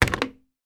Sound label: household hairdryer hotel lift out of wall mounted holder